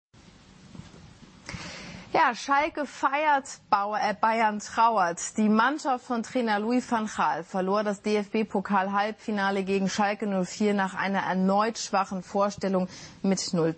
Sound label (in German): schalke feiert